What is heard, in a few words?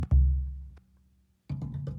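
Calm Far, music; from dust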